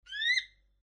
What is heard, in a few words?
cockatiel